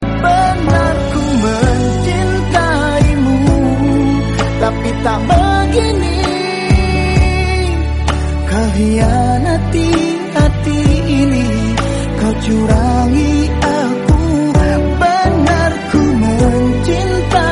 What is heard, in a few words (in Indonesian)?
lagu romantis indonesian nada dering